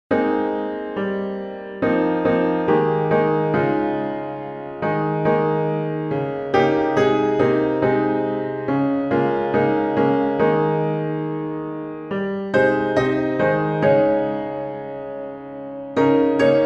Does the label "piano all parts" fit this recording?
Yes